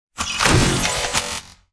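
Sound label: tripmine explosion digital; weapons; Half Life Alyx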